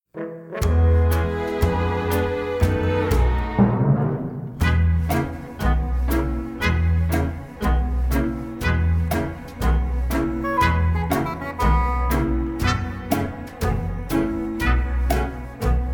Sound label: backing